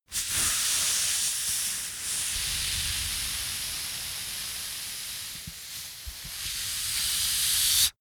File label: balloon air release long